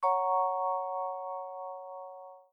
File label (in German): Klingel Akkord